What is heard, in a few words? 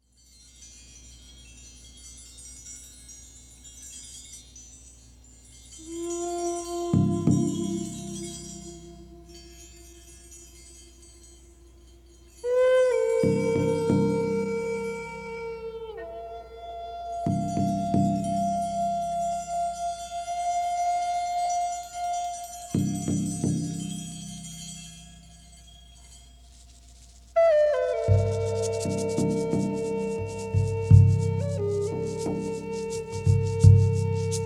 Ooooh, native american flutes